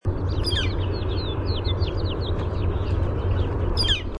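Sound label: Northern Flicker peah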